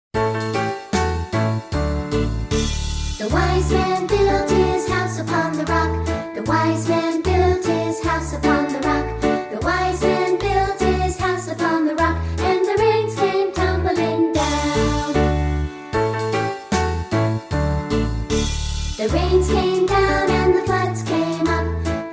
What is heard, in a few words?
Childrens Choir